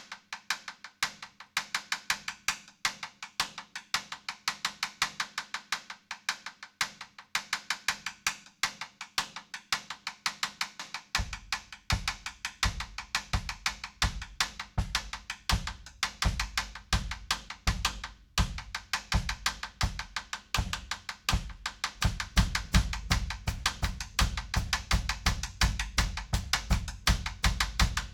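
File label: drum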